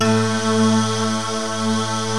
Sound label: MULTI SYNTH